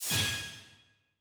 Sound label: Hit Rock Value Good